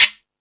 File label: Percussion, Drum Machines E-MU Pro-Cussion